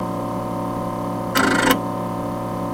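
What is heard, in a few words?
Apple Iie Disc Drive, computers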